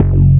Syn Bass; Equipped Music

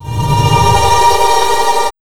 HRD 130 SYN, Big Beat

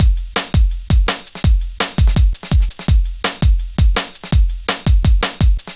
Drum Loops, Jungle, 166.5

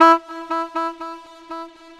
Sax Echo; Keys; Horns; Drum & Bass

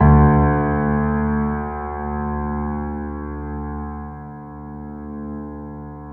PED MF D, GRAND PED; Best Service Advanced Media Trax Modern Composer

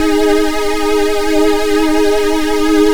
SWING G#2, LEAD SYNTH; Best Service Alpha Dance vol.2

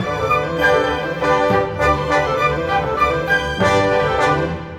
Orchestra; Sonic Foundry (Sony Creative Software); Orchestral VOL-2; Modern

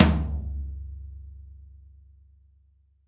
Folk Tom

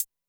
closed hihat, Zoom MRT-3B

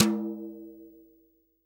TOM, Real Drum Kit, SWING KIT

